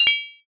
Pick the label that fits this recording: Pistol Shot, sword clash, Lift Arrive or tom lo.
sword clash